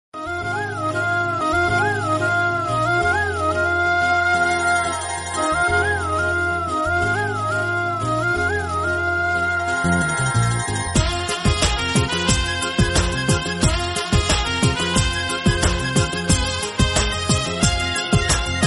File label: Love BGM Telugu Ringtone